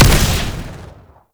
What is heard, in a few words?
Shield And Sword Explosion; Warframe; Grineer; Mortifor